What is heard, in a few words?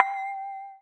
glock, Synths; Notes